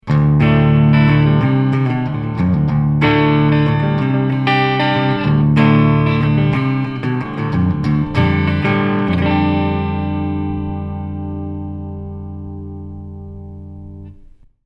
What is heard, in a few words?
Amp 1 Channel A